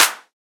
clap sound f key, Royality free hand clap tuned to the F note. Loudest frequency: 5375Hz; percussion; single hits one shots